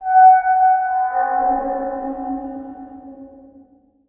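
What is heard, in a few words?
underground metal; blackforest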